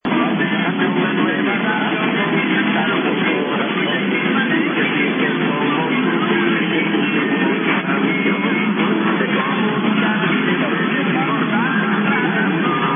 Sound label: Jingle; Radio Acuarela